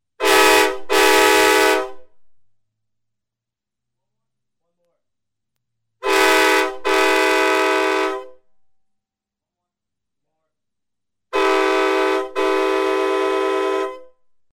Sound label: train horn samples